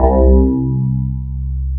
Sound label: DS8 C 2, Korg; Back In Time Records; Korg Universe VOL-1